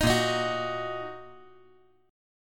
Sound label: A sharp Suspended 4th Sharp 5th down